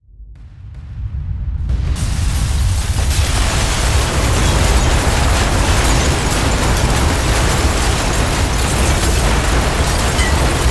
NUKE

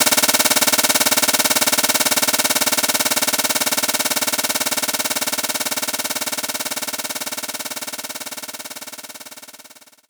Brush Snare

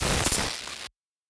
Glitch

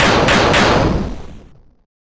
Cannon Victory Overlay

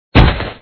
Ground; Dad 'n Me

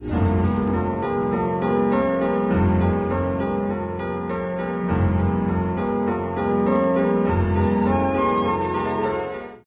Story Loop; Chocolatier; nds

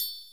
foil gloss; Mazebert TD; android

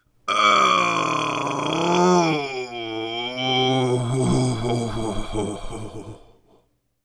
Grunt

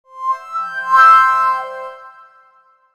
Win sfx; Electric Box 2

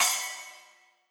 Instr Cymbal; Backpack Hero

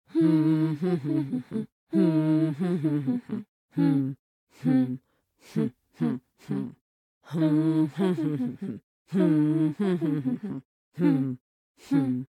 Voice song, en

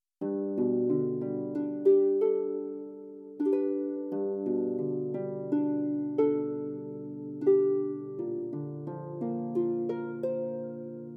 Harps, i; Grand Ages Medieval

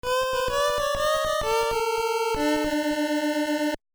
Melody; Mother Earthbound Zero; nes; Melody 3 (Monkey)

